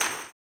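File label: tambourine, 160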